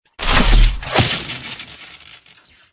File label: lightning arrow; battle